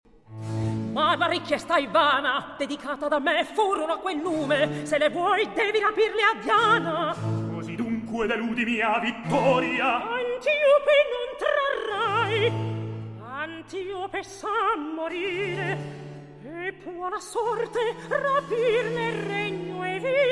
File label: Recitativo; Antiope, Ercole